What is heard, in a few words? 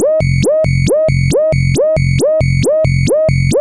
Groovy, techno acid